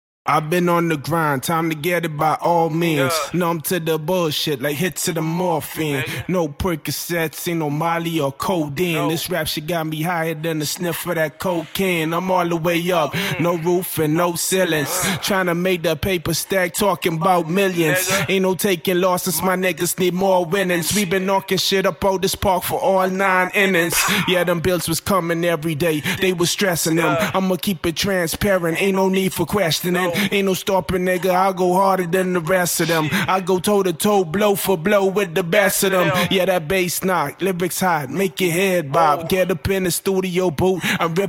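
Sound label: Hip Hop 160 Fm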